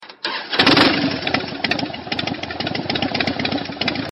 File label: Harley Motorcycle